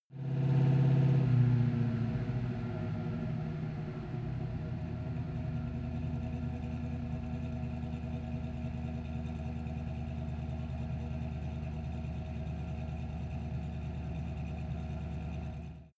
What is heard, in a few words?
car camaro slow down exhaust